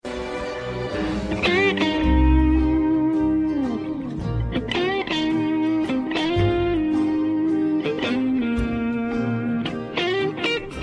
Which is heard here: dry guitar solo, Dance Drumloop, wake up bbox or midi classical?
dry guitar solo